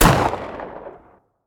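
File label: marlin exterior shot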